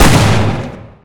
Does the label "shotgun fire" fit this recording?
Yes